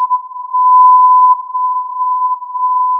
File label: filtered input